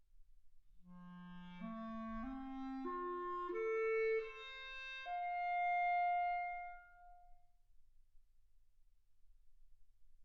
clarinet

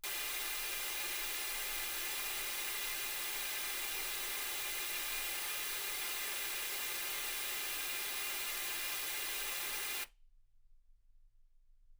wgn

